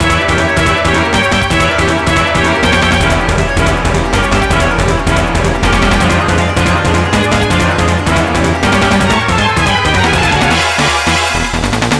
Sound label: loop